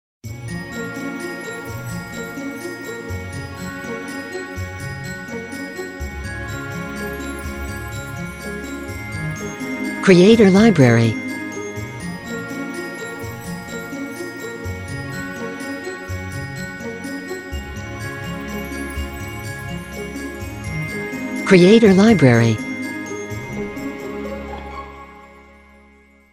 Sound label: Christmas Magic Ident